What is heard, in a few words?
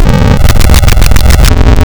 Random Step, wavetables; Architecture 32